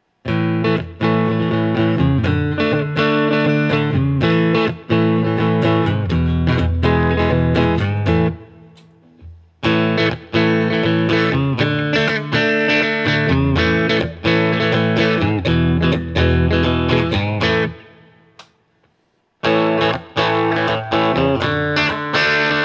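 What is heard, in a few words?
Guild X500T Open Chords, guitar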